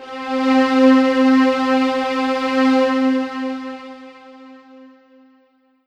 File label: Ensoniq ZR-76 Hot Bath C4